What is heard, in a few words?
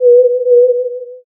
owl